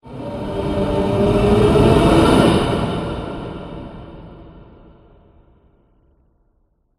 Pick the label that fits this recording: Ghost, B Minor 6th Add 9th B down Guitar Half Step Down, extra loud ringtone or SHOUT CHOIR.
Ghost